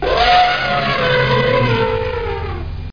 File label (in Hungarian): elefánt